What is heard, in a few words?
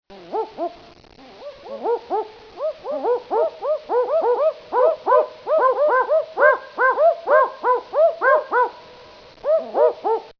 barking owl